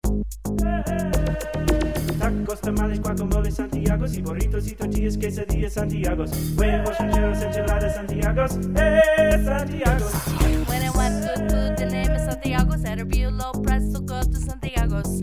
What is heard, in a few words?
Tacos Jingle